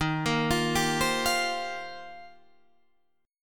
Eb 6th Add 9th, Guitar, Open D

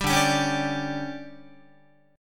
D Major 7th Suspended 4th, Guitar; strummed; 10,10,10,11,11,13; 4A,4E,5A,5D,5G#,6C#; chord

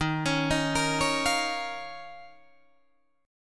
D sharp 7th Suspended 2nd D sharp, Guitar, DADGAD

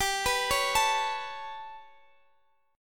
B 7th Suspended 2nd Sharp 5th, chord

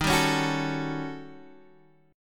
Eb 7th Sharp 9th Flat 5th, Guitar Open D; 13,10,11,12,12,x; down

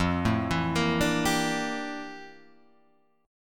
F Major 13th, chord; arpeggiated; JGuitar

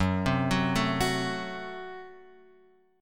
Gb Diminished, down, Guitar, Open F; arpeggiated; JGuitar

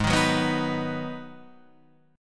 G sharp Minor 7th, Guitar Half Step Down

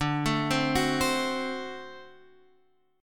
D 7th D down

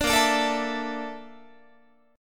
A sharp Major Sharp 11th, Guitar; strummed

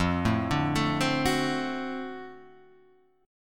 D 7th F, guitar; D7/F arpeggiated; JGuitar

